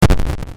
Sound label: Enemy bomb boom